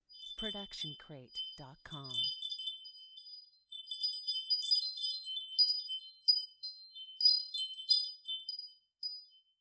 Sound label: Wind Chimes C; SoundsCrate